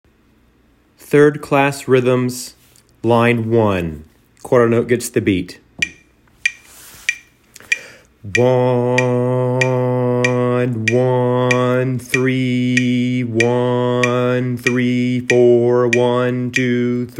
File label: rhythms